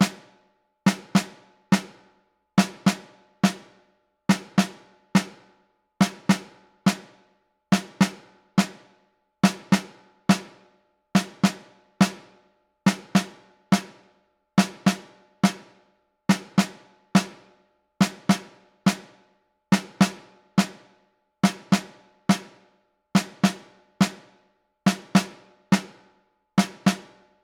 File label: Torn Stitches - metronome; underhero switch gamerip 2020